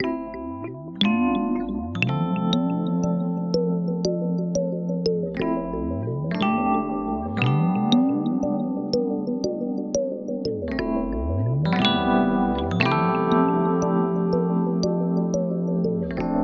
Underwater Meditation, alarms; Honor Pad X7

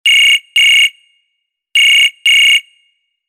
High frequency, ringtones; Coolpad

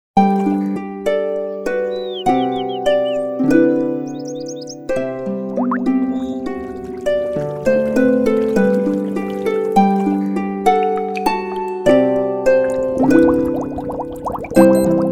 Clear Wate, alarms; Elephone U Pro

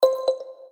Marble; Samsung Galaxy A3 2017; notifications

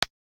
Pretouch Press; FCNT Rakuraku F53 E

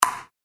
Bounce

